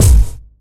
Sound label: clicky steel kick drum G sharp key